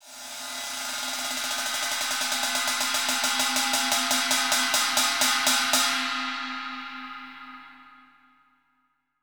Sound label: ride